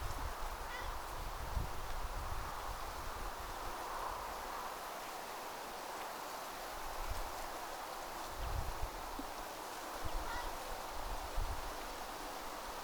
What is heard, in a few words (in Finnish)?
mika lokkilaji